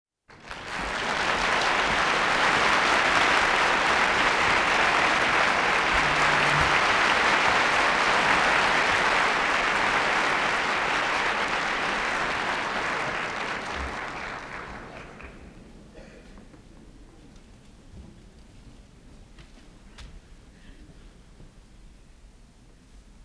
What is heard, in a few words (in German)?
Beifall; L. v. Beethoven, Klavierkonzert Nr. 5 Es-Dur op. 73